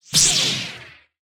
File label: lightsword turning on; soundscrate